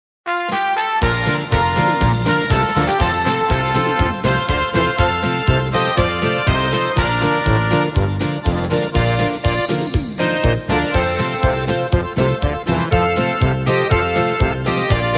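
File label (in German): Pfaelzer Stimmungs Medley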